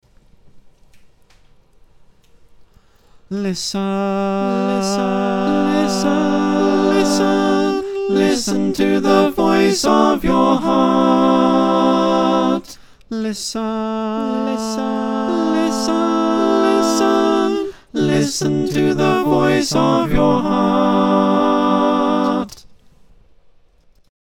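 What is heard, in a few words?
soprano alto tenor bass, choir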